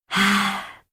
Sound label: sigh; kzyumiki